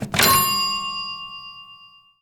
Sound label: early 20th century cash register calculation